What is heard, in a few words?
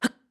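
Jump, voice; Link; BOTW; Breath of the Wild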